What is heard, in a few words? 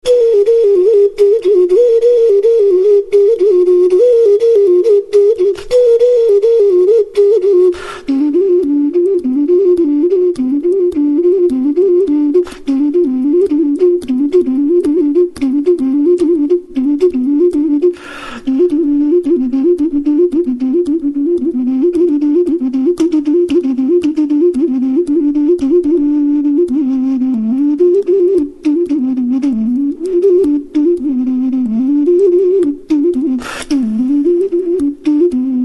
Okarina